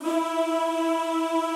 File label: E3 L, Classical Choir; Best Service; Extended Classical Choir